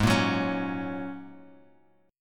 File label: G sharp 7th Suspended 2nd Suspended 4th down Bass Standard, chord; G#7sus2sus4 strummed; JGuitar